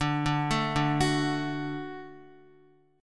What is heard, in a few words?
D-Major, D, Guitar, 4F, 4A, 5C, 5G, 5C, 6E; D arpeggiated; JGuitar